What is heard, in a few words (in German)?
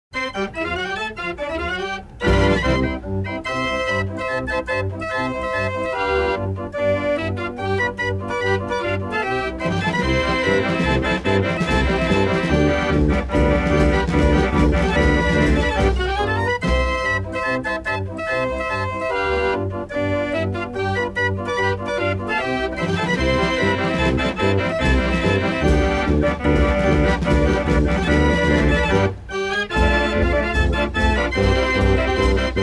kirmesorgel; kirmesorgel souvenir